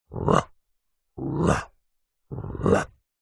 cartoon dog growls ruff barks

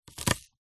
leek slice knife on wooden chopping board

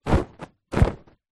household pillow case shake

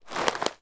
food snack mix pack single movement

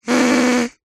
party whistle horn blow single weak broken

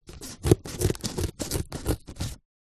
office scissors cutting cardboard

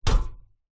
household bed metal frame impact; zapsplat

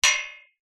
impacts metal gate post hit with small stone

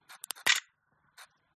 Flash crack picture takes

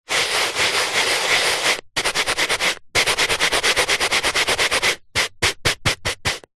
brush small scrub carpet; household

